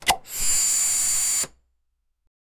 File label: short air suction attempt